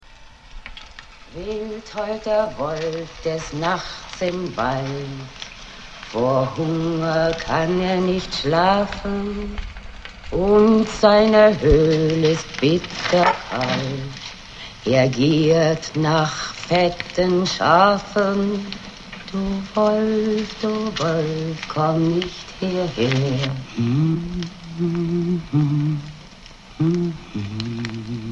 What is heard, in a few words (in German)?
Wolfs Lied singt; Ronja Raeuber Tochter; Lovis